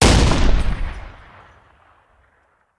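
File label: Artillery Cannon Anti Aircraft; Sound Ideas; Guns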